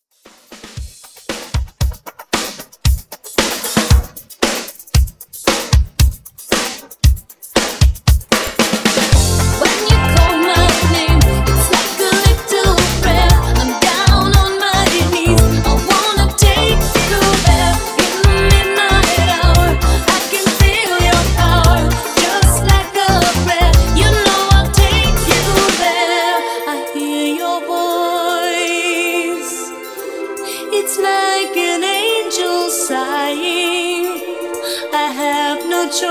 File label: Re drum